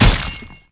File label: metal flesh; Fate The Cursed King